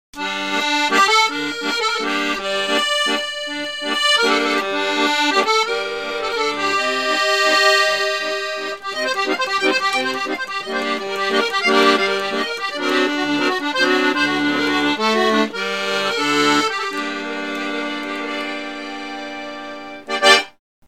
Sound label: Musette, accordion